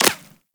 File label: Bullet Impact Flesh; Warframe